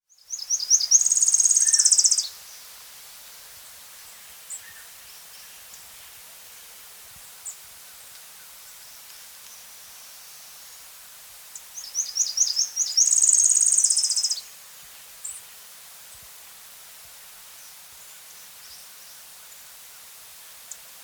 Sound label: Tropical Parula (Parula Pitiayumi), Andean Birds; S. America